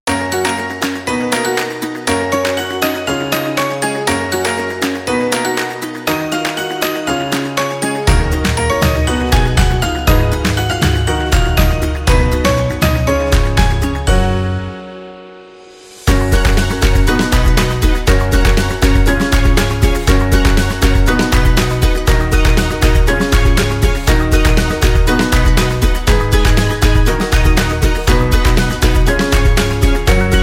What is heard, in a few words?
Quirky